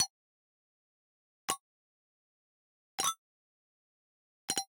Digital Click Neutral

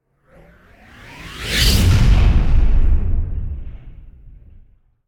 Whoosh Fire By - Trailer Element; Hollywood Edge; sound designer's toolkit v2

